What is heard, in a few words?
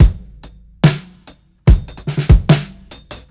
FAT 145 FILL, DRUMS LOOPS; BASEMENT DRUMS VOL 2